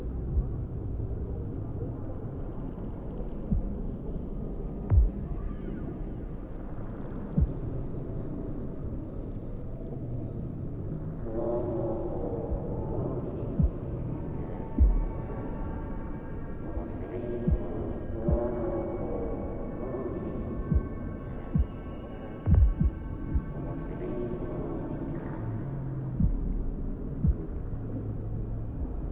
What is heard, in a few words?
background audio